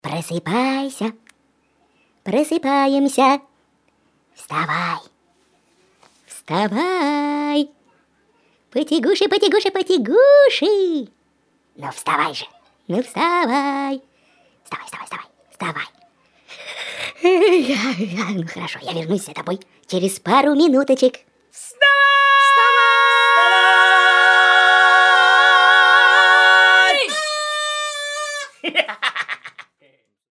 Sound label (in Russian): Budilnik Vstavay